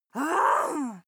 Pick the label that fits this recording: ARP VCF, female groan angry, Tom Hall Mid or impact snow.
female groan angry